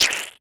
human puss squeeze squirt

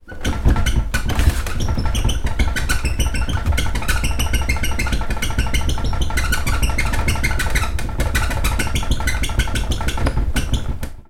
household sideboard shake squeak wooden

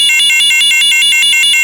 science fiction incoming missile alarm

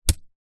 warfare knife throw dig into grass hard

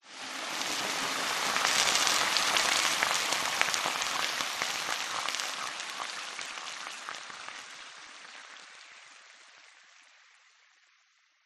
nature water hit hot metal sizzle bubble

